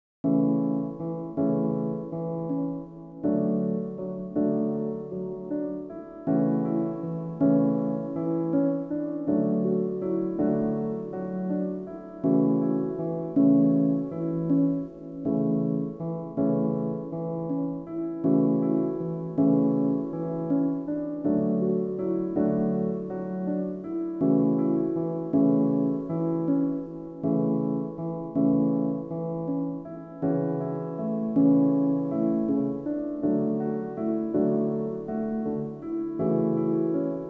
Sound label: Classical Music, Piano